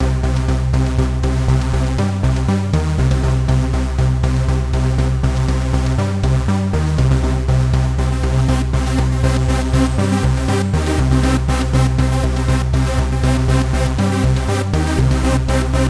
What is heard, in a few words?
Gabber Loop